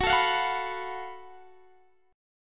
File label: Gb Minor Major 11th Gb, guitar; GbmM11 strummed; x,21,19,22,19,19